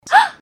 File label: gasp; pony; Twilight Sparkle